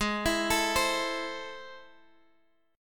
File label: A Minor, G sharp; Am/G#; arpeggiated; chordshape